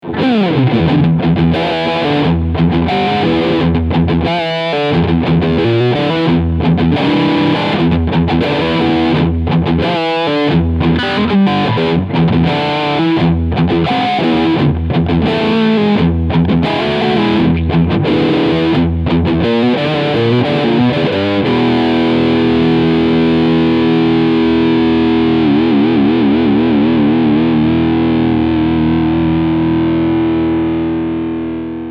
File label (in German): Riffsound Steg PU